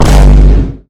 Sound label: vine boom kick